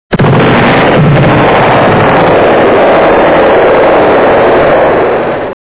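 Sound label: sound ship explosion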